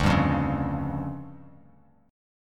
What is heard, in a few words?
D Minor Major 7th Double Flat 5th, D, Bass Standard; DmM7bb5; strummed; JGuitar